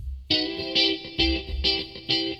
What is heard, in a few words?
GUITAR, ROCK POP; MAGIX